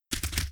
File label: Ice Deer run